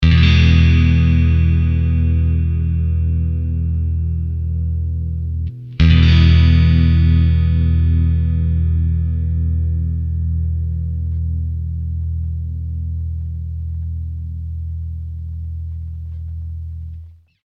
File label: clean neck coil 1 2; smoke stack ii